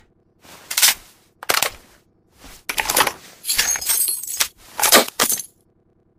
M248 Saw Reload

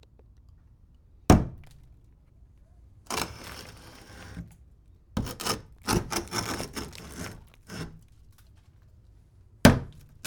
Wood Banging Breaking

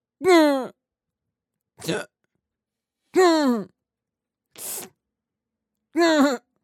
A Variation Of Impacts

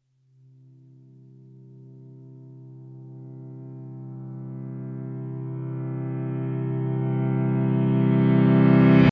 Reverse Piano 3 Note Tune

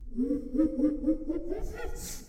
Creepy Laughter

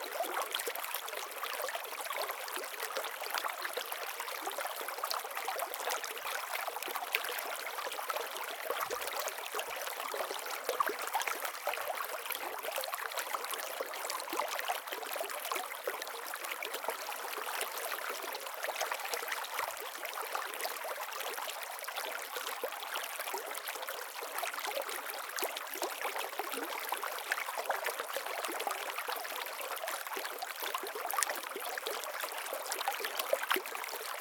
Ambiance River Flow Small Loop Stereo

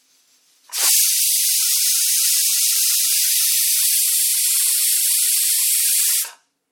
High Pressure Cooker

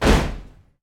Foot Stomp

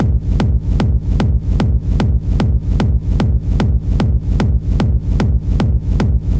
Hard Techno Schranz Rumble Kick Loop 150 Bpm

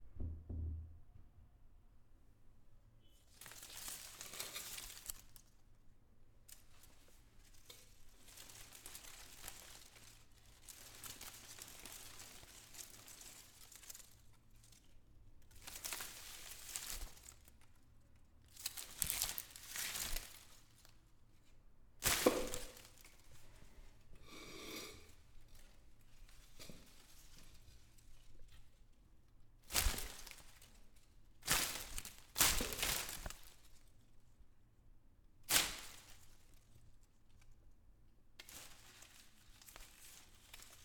Plant Noises

